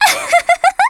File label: Laugh; Princess Styla laughing